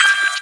affirm, console; SINDEMO

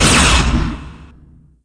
G-Laser; ImpGal2; groundwar

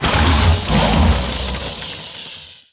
crocodile die; DAoC

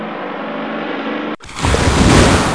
bomb; soldats du feu

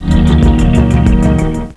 Zoom

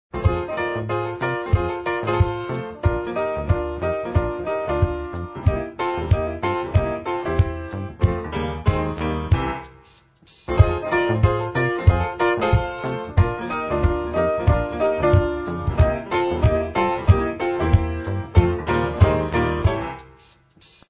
menu Loop; mom mania

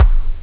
electro, drums snare; OctaMED SoundStudio V1

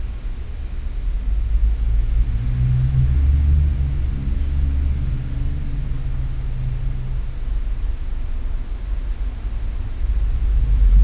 ATMO; TKKG 4

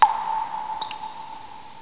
DRIP

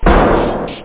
bing; szenarion

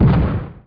Shrapnel; Deep Fighter

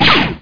RIFLE

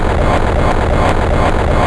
TV set; My Science Fair

